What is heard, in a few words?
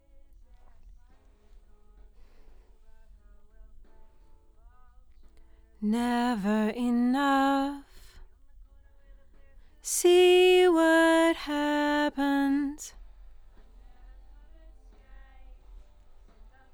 LdVOX Double